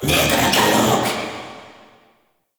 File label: Summon Vocal Verb; Warframe; Uriel; Vythelas